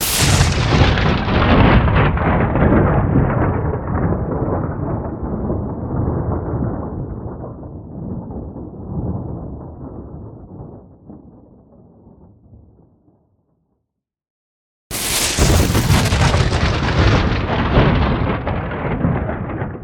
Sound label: Thunderclaps Artificial With Reverb